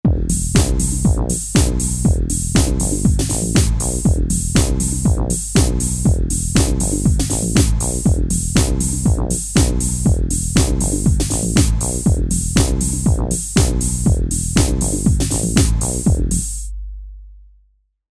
Techno

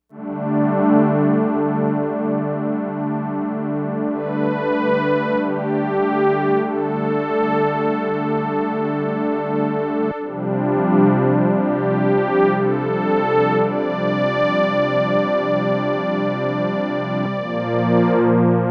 Auditorium

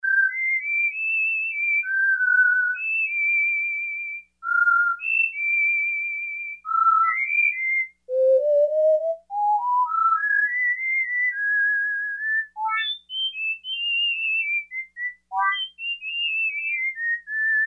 Whistle, Kawai K1m

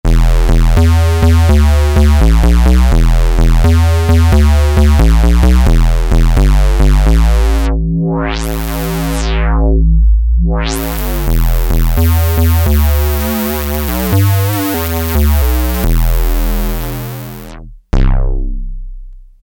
Square In, Novation XioSynth 25

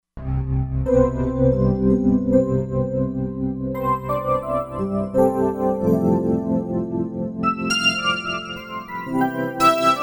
Vint Clavier, Roland Fantom XR

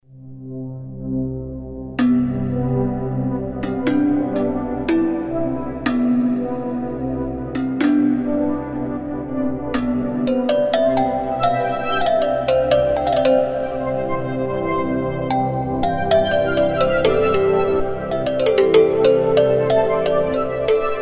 Foggy Bells

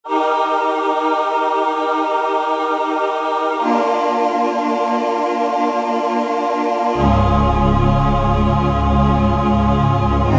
Choir, Korg M1; Factory preset examples

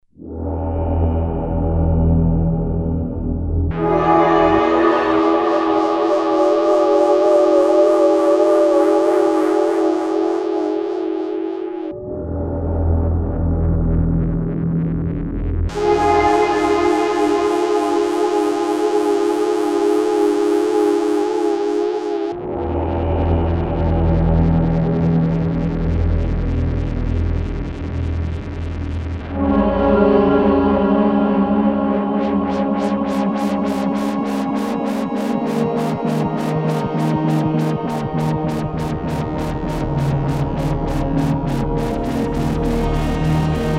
Pad

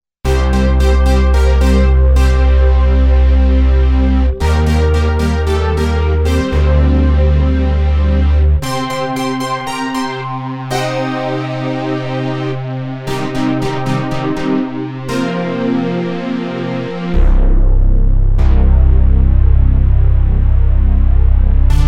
80s Analogues

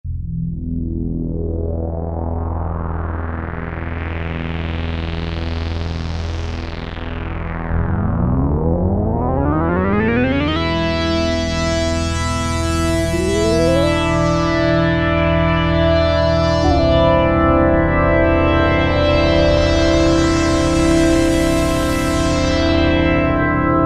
Kawai SX-240

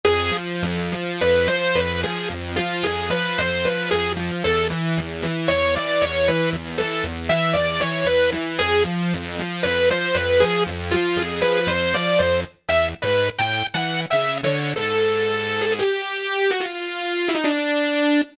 Lo-Fi Saw; Roland SC-880; Factory Patches

